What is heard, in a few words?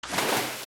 Splash; Morpha; OOT